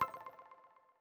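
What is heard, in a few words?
notification success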